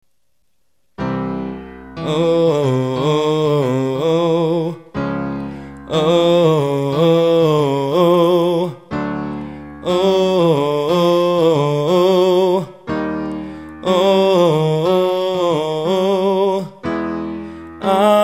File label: Exercise major to minor bluesy